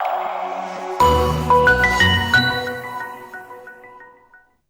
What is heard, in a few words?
Samsung Galaxy S2 & Europa Startup